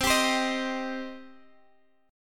C Minor C; strummed; x,x,10,12,13,11

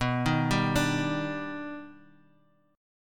D sharp Augmented Major 7th B

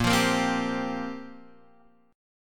C 7th Suspended 4th, guitar

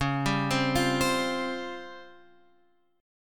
C sharp Major 7th Flat 5th Guitar Open F; C#M7b5; arpeggiated

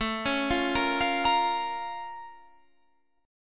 Bb Minor A; Bbm/A

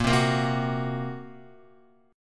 Bb Suspended 2nd Sharp 5th, Guitar; Bbsus2#5; strummed; x,1,0,3,0,2; JGuitar

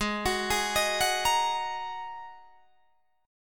G sharp 7th Suspended 2nd G sharp Guitar DADGAD

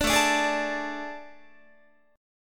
A Major 7th Suspended 4th, Guitar; AM7sus4; strummed

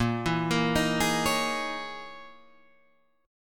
Db Minor 6th Add 9th Bb

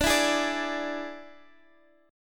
D Suspended 2nd Flat 5th D Guitar Drop D

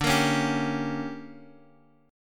D Major 7th Suspended 4th Sharp 5th, chord; DM7sus4#5 Chord {X 5 X 3 2 3} strummed

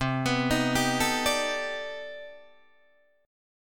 C Minor Major 13th C down Guitar DADGAD

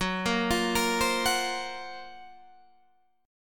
F sharp Major 7th Flat 5th, Guitar, DADGAD

